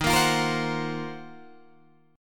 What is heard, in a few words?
D sharp Minor 6th Add 9th, down Guitar DADGAD